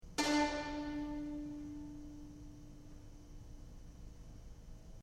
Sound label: Vln B Pizz D